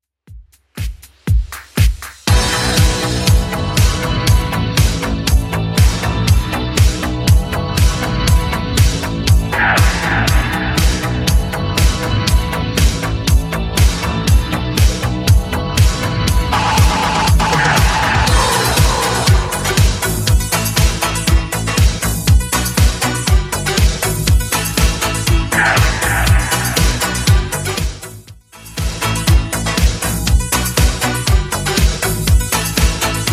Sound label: 80s Redrum 120bpm